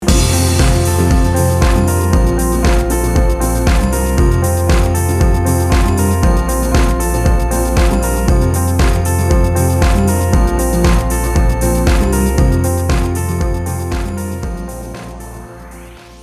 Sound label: Instrumental